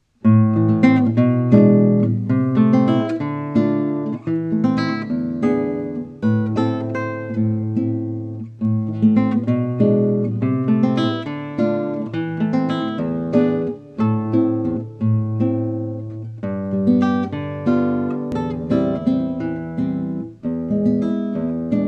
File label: samba; sagreras julio